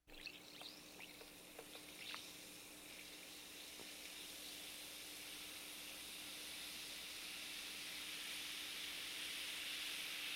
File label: Carbonated Water Fizzling